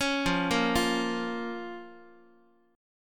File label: F Suspended 4th Sharp 5th, down, Guitar; arpeggiated; x,x,4,3,2,4; JGuitar